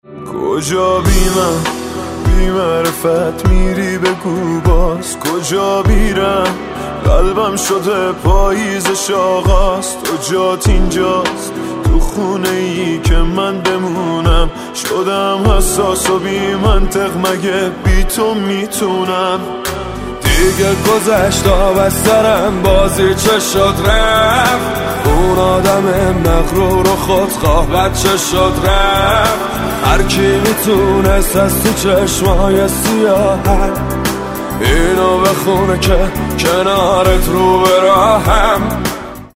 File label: Ringtone Mobile